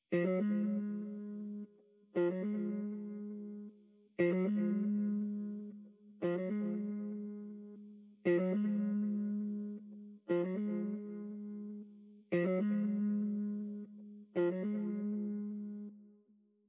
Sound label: 118 BPM D# Min Guitar Halftime; Loop Stems; Cymatics; 2022 Melody Collection Platinum Expansion; Miami